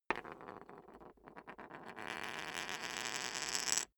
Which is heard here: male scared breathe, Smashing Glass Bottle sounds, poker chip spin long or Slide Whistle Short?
poker chip spin long